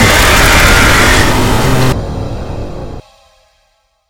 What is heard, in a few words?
attack; springbonnie